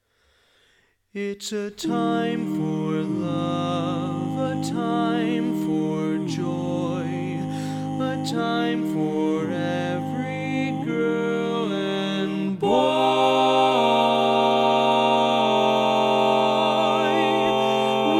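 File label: Baritone, Barbershop Tags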